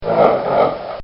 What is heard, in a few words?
Horse Grunt; Horses; Animals; Bobby Cole